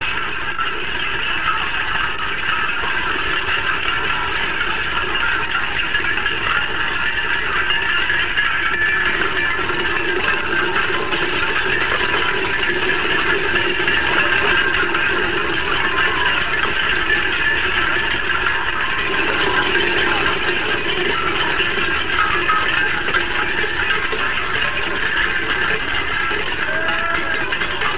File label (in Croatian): zvoncari